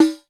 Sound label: SCHELLDR; drum